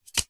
aerosol deodorant can catch

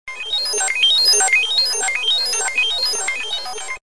science fiction robot beeps glitch

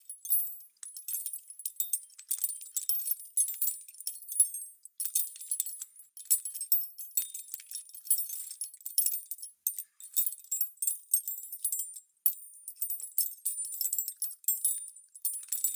Just Chains pull Hanging Jingle; soundbits

